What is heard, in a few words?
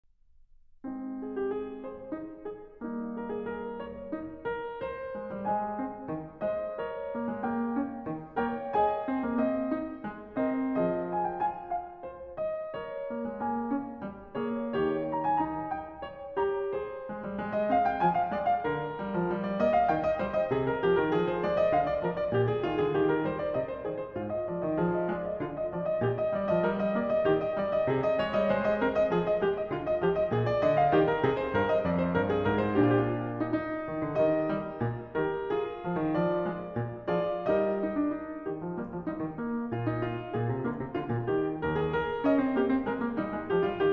The Well-Tempered Clavier Book I Prelude No 17 in A Flat Major; JS Bach; BWV 862